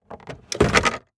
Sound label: Auto Saturn Open Glove Box; Transportation